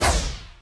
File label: ship arrow; PHARAOH